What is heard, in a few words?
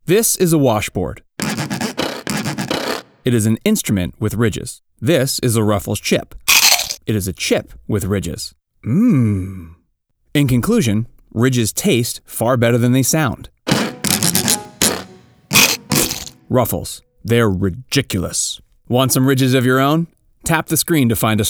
Washboard; iPhone